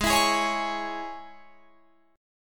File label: G sharp Suspended 4th Sharp 5th G sharp, Guitar Drop D